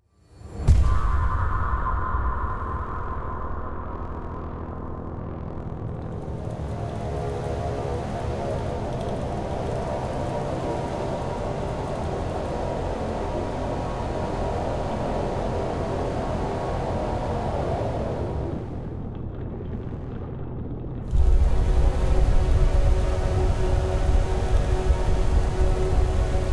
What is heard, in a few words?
City Music, Crowd Surround Music and SFX minus Lights